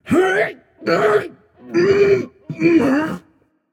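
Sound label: grunt lift, male; smite; voicepack; rama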